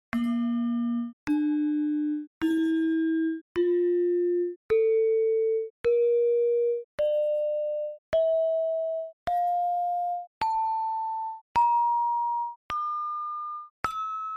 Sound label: gender panerus pelog